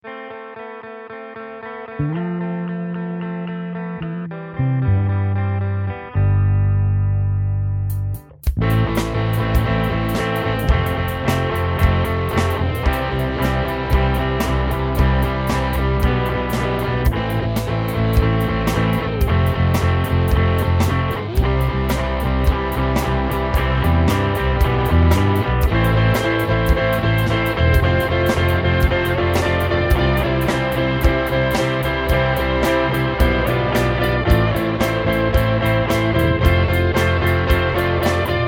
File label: 4 4 rock beat